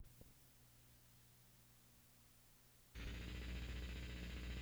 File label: farfisa II